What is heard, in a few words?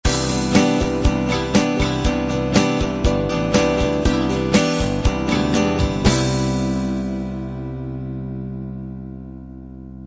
light rock end